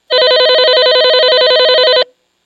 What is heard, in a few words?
hirooka 2 bannsenn